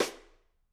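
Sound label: Snare Stick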